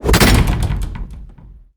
door Slam

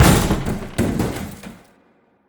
table Slam

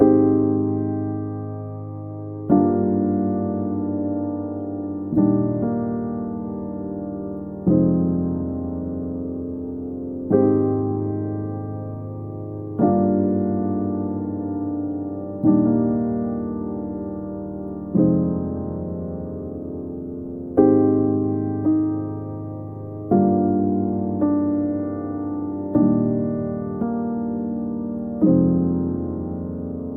piano stem

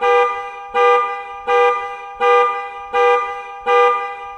car Alarm Loop

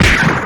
slapstick Super Punch

